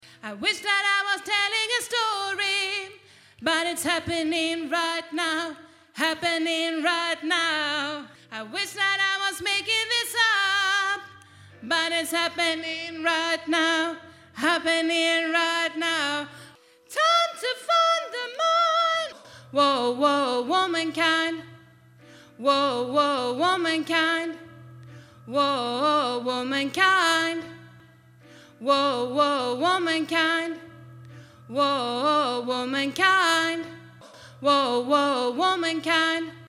womankind tenor, stämmor

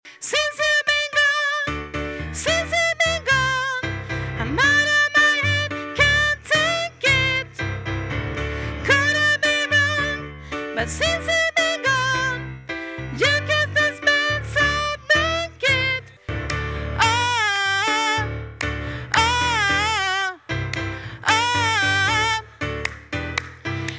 bas

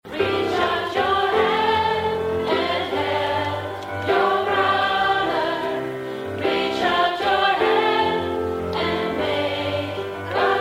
reach out your hand alt, stammor

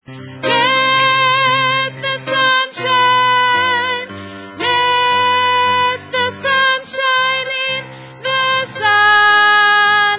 bas, stammor

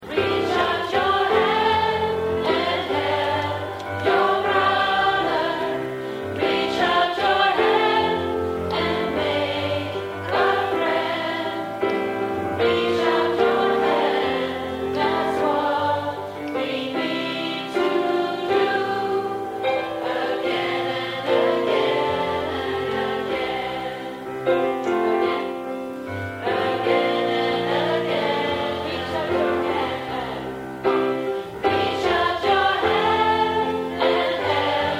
bas; stammor

